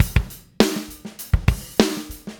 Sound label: 100 Laid Back Drums; Pop Rock; Moderate Tempo; Pro Tools Essential Loops; Digidesign